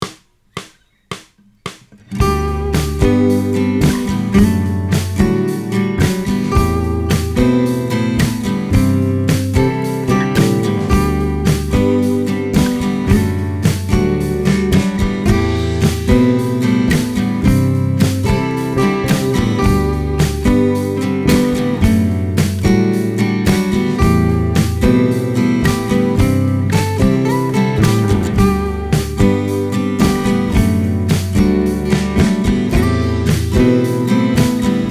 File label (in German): grundtoene mit bass; grundtoene meiden kursteil 3